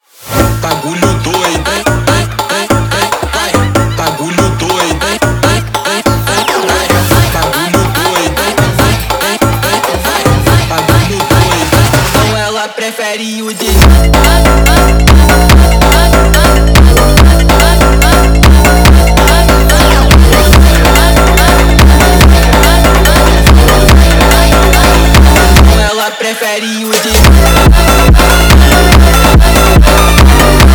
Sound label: funk sped up